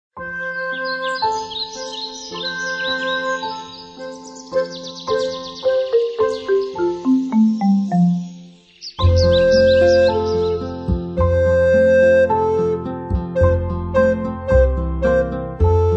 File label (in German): der kuckuck